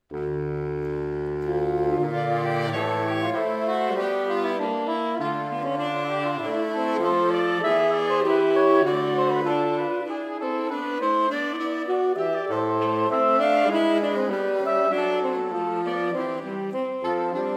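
Preludium und Fuga, re minore, saxophone quartet, Barock; J. Seger; U. Leuenhagen; Lilienthaler Saxophon-Quartett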